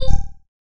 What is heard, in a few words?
Video Transmit Begin; android 4.4.4; effects